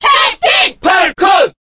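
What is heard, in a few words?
Cheer Korean, crowd; Captain Falcon; SSBB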